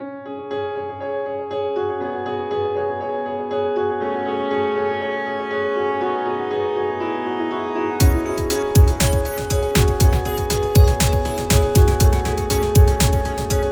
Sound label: Forest Battle; starbound ost 2015